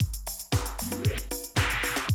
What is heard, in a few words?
Classic Drum Loops